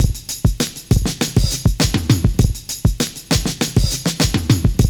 JAZZY FILL; Univers Sons - Kitch 70s

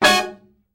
Killer Horns, SECTION 7TH; Best Service

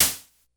Snare, Drum Machines, Korg DS-10

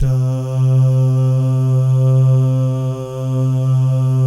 DAH, Vocal Jazz; ILIO

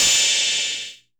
DIST 606 CYM, TECHNO; Drums In Your FACE!

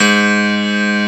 STUDY PNO; East West; Global Sounds

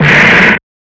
Snares, Alesis Performance-Pad

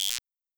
Guiro Short, Wersi WM 24 drums

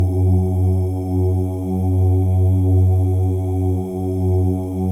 MALE OOS, Symphony of Voices; Spectrasonics

